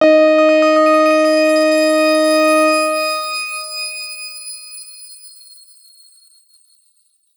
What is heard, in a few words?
X Grain D#4 ff; healing soundscapes; Ableton